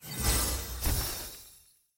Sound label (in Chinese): 季后赛 新关卡解锁; nba